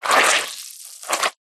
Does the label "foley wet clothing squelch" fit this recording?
Yes